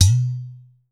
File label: udu, percussion; BRT UDU D; Voice Crystal - Steve Reid Definitive Percussion